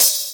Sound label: bright open high hat f sharp key, Royality free open hi hat sample tuned to the F# note